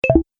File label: Game Menu Remove, Video Game Sound Effects